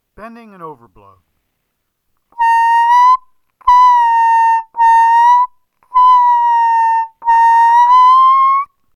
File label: Bending Overblow